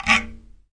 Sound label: arabic perc, Arabic Percussion Kit